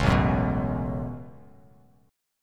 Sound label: A 6th Add 9th A down Bass Standard; A6add9; strummed